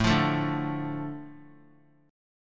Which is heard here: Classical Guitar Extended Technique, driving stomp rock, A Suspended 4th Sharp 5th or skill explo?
A Suspended 4th Sharp 5th